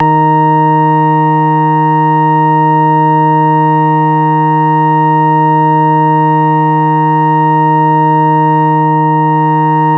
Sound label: Pad D#2, Prophet; Worra's Prophet ProphOrg; Oldies